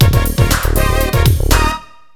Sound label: Disco; Mortal Kombat II